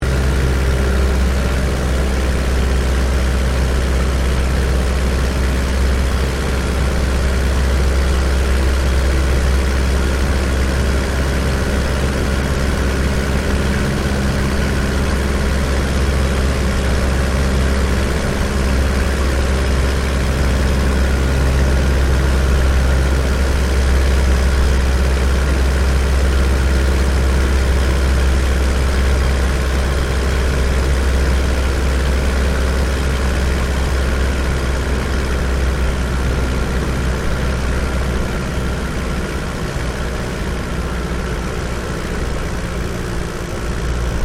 AIRCRAFT DH82A DEHAVILAND TIGERMOTH FLYING DECCELERATING INTERIOR LOOP; RAAF Tigermoth; Airplanes